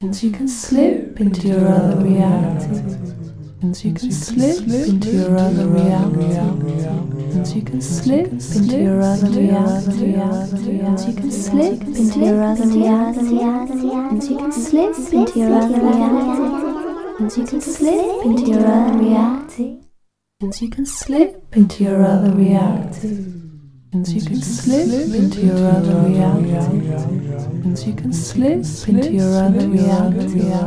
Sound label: lfo delay